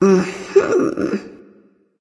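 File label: nonverbal agree; smite; ratatoskr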